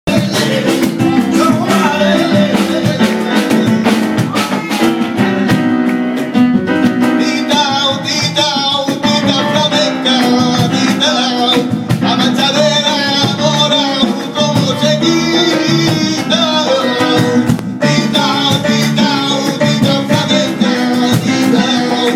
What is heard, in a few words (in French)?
Intro musicale